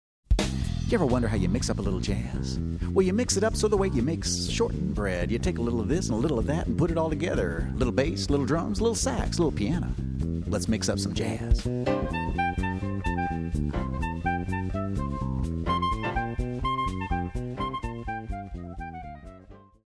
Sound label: Bluesy Bread